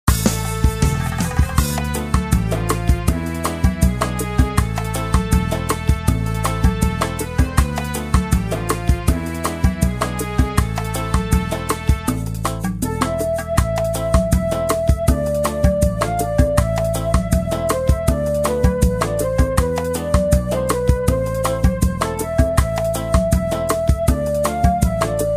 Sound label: Flute